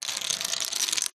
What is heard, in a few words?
leisure domino chain reaction short wood